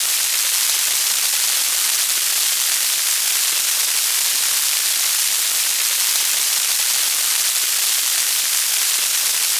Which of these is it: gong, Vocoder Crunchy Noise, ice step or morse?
Vocoder Crunchy Noise